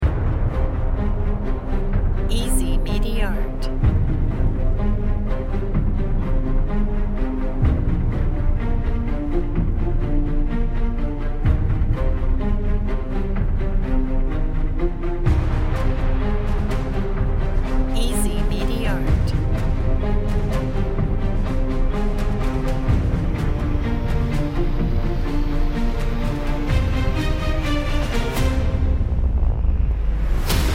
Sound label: epic mission looped version